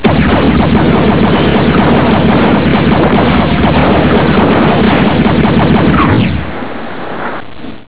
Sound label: gatling